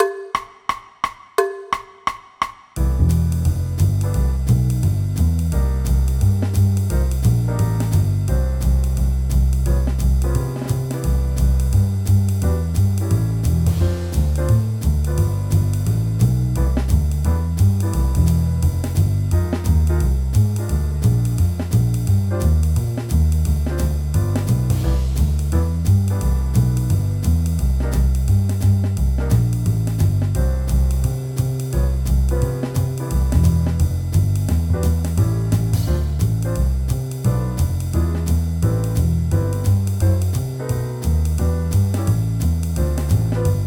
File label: GUITAR; Honour Bands; Jazz